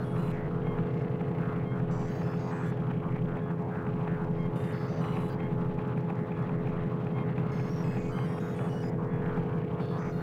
Control Room Loop; ogxbox; Ambient Audio; XDK4627 BIOS and XDK Launcher and Dashboard(for Retail)

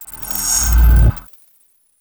Settings Main Menu In; XDK4627 BIOS and XDK Launcher and Dashboard(for Retail); ogxbox

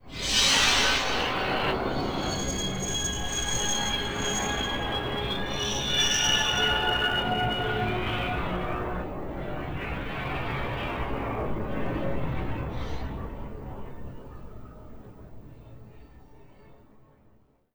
ambient Steam; Ambient Audio; XDK4627 BIOS and XDK Launcher and Dashboard(for Retail); ogxbox

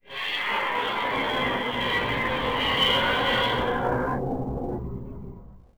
ambient Voices; Ambient Audio; XDK4627 BIOS and XDK Launcher and Dashboard(for Retail); ogxbox

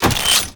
Music CD Select; Music Audio; XDK4627 BIOS and XDK Launcher and Dashboard(for Retail); ogxbox

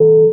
select button; XDK4627 BIOS and XDK Launcher and Dashboard(for Retail); ogxbox

